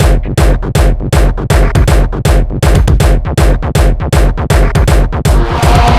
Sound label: Beats Loop